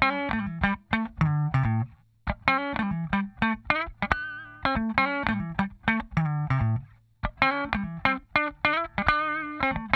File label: TRICKY, Funk Guitar; 90 s Sample CDs; Zero G